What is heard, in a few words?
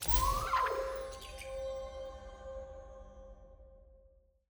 ui button click; lol; fox